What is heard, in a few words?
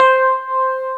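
C4 electric piano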